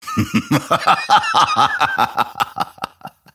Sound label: grimstroke wheel laugh; dota